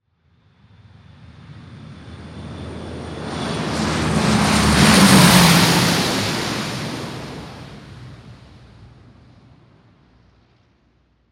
Lockheed Martin By, Transportation, Aircraft, Plane, Pass, By, Close, Lockheed, Martin, C130E, Military; Airplane; BlastWave